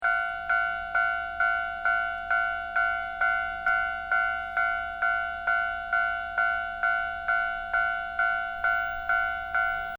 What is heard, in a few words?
Train Japan Level Crossing Bells Loop; Japanese Trains; Vehicles